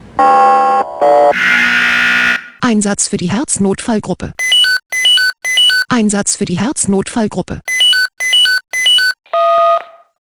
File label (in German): SMS Alarmierungstone Herznotfallgruppe